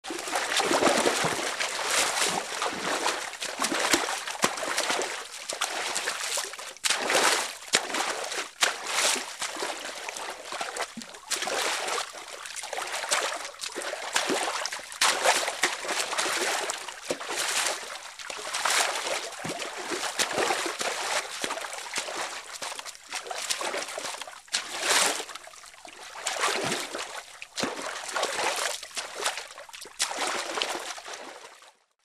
Man swims

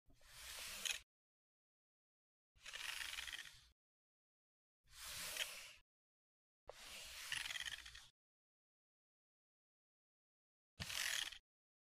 Computer mouse movement

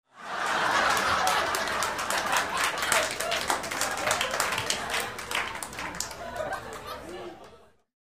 Laughter and applause in the room; standing ovation

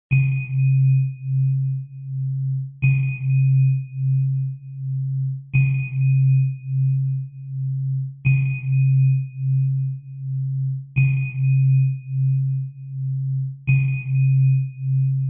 Spaceship warning signal; radio signals

